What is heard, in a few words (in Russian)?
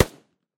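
выстрел из снайперской винтовки с глушителем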